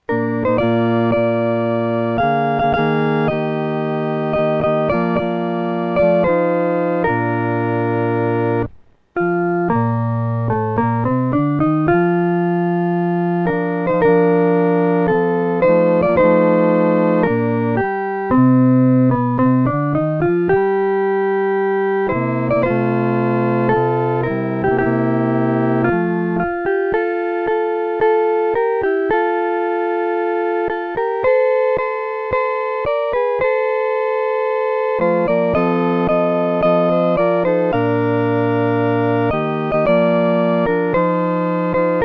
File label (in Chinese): 合奏 四声部; Hallelujah for the Cross !; Horatius Bonar, 1808-1889